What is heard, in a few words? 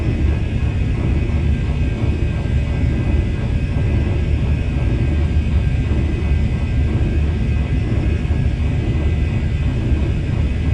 Assembly Giant Rotating Pillar; Boomtown; Titanfall 2